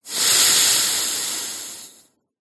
human male breath out close up through nose stuffy; zapsplat